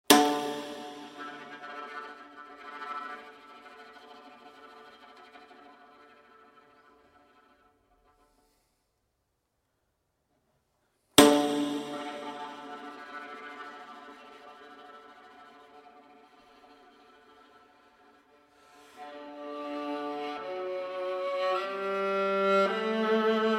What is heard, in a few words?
fortepiano